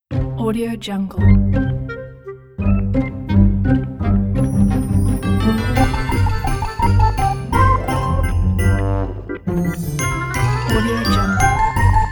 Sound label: very crazy cartoon intro; flaviocortizo